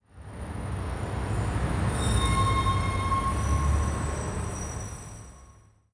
city, zones; Zoom3City

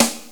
Open Rim Shot; XG

